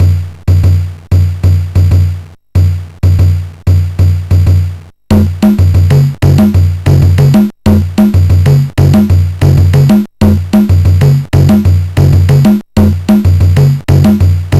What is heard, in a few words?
Rock tune, MOS6581; sid